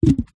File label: foley cork stopper place on glass jar